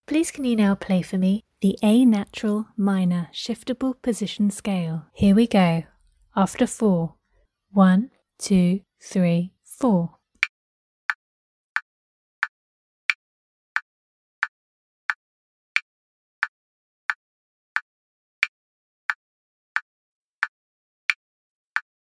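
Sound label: a natural minor shift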